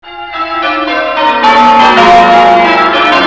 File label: PEEL; SOUND FX; cubase